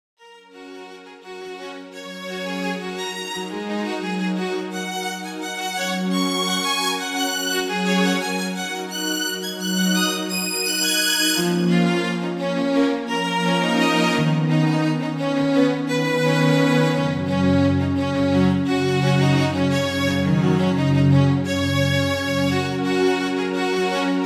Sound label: celtic camera strings